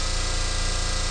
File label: Compressor HS-20 loop